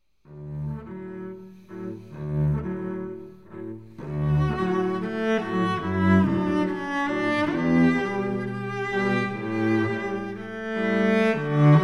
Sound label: Cello Duo; salut damour